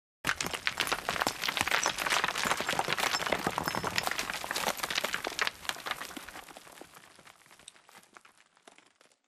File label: intro debris; Titanfall 2